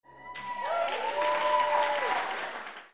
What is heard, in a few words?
PEOPLE